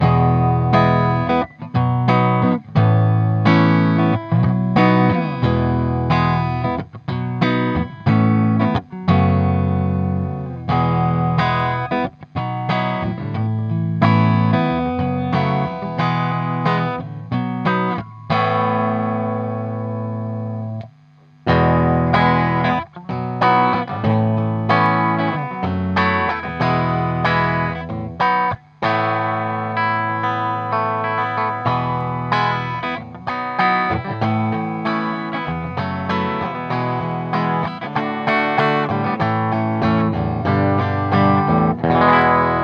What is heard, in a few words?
mesa str420 6l6gc, clean; paateasteputkivertailu